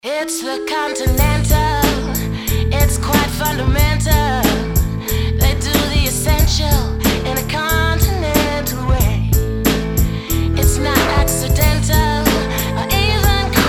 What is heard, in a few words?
full sung